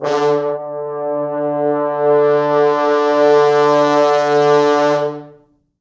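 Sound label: 4 French Horns, Horns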